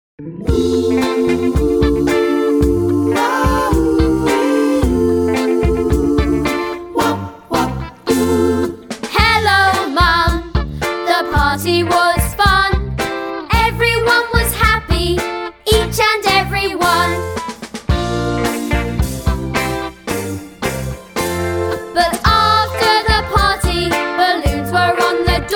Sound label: karaoke